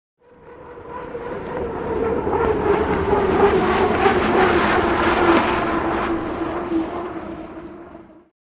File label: Hydroplane By Fast